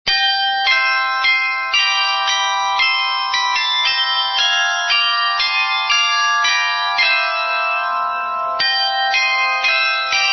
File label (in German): Glockenspiel